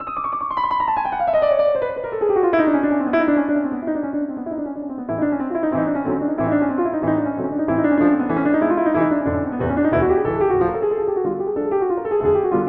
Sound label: Bumblebee on Czech Grand; Ant Petrof Grand; Pianoteq